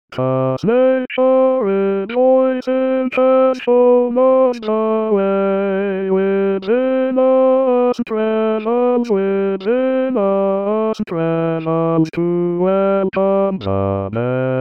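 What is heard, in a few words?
voix chorus bass; purcell; ode 1694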